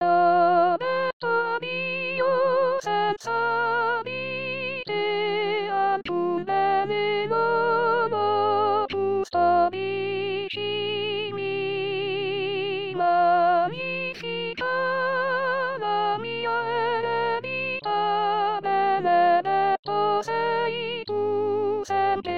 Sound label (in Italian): Custodiscimi Soprani